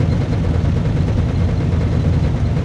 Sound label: Heavy Tank Loop; blue archive